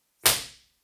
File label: impulse response